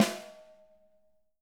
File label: 6X12 ALUMNUM, Living Drums